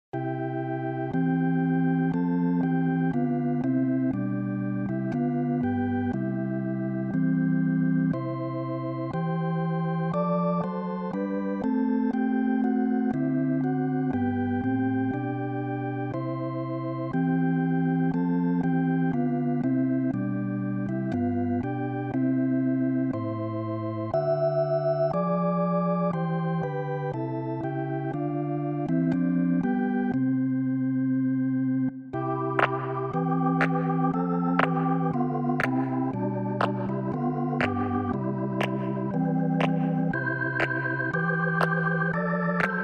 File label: Rotary Fun